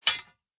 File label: knock; chicken